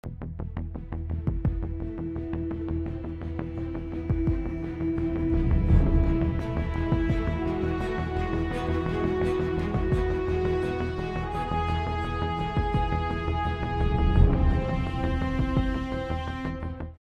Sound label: Battle Loop; Infinity Blade 2